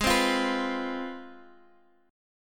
C Major 7th Suspended 4th Sharp 5th, G sharp, chord